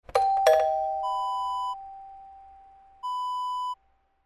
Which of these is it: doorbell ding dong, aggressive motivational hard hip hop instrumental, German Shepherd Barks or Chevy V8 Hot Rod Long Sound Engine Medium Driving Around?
doorbell ding dong